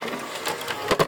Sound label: terminal insert disc; Machines